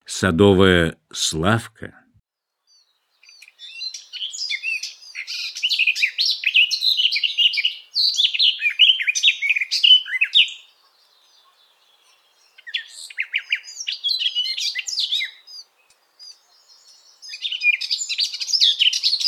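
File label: garden warbler; Golosa ptic i zverei; Sadovaia slavka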